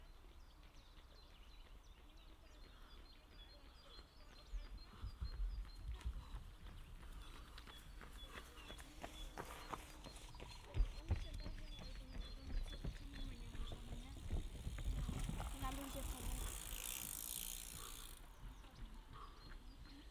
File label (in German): Läufer; Lexikon-Roman